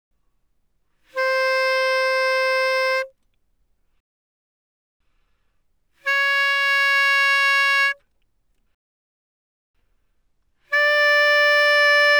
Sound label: Bass Clarinet ff C5 Bb5, woodwinds